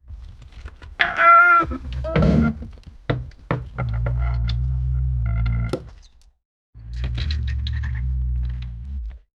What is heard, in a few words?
mood miserable, labor